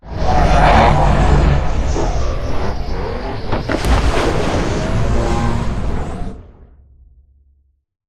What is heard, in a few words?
strafe; Beacon; Titanfall 2